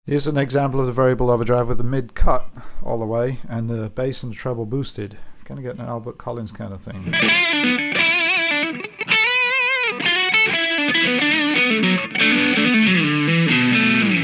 EQ Mid Cut

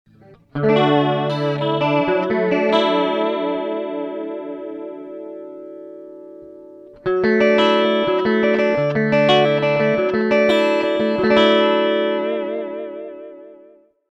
Clean Chorus

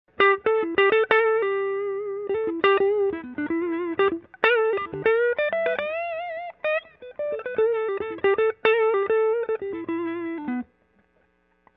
strat neck clean rat setting; deucetone

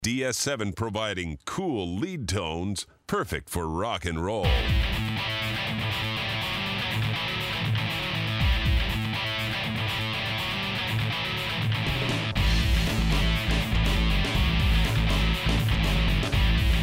Cool Lead Tones

